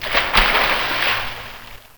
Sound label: splsh; Tempra Access; comdex